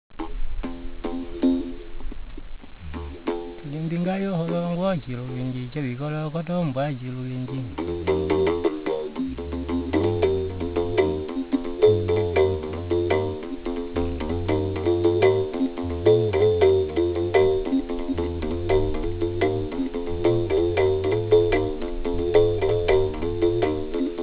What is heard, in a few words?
musical bow